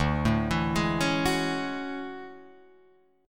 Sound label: D Major Sharp 11th D Guitar Drop D